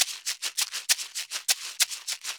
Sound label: Maracas 100 bpm; Drums & Percussion; Best Service; Festa Latina VOL-1